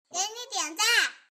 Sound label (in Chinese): 点赞